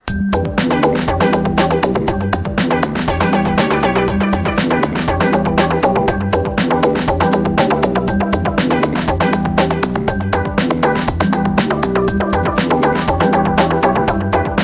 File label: Slinky Beats; Nokia; 5300 XpressMusic